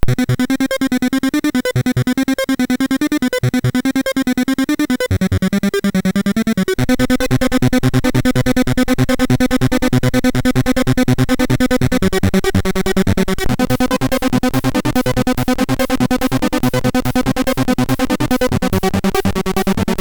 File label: Rave sid MOS6581R3; Jabol